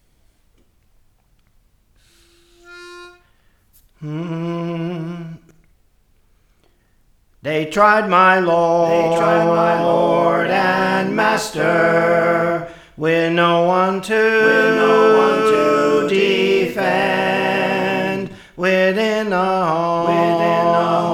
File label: Soprano; Praise For The Lord Training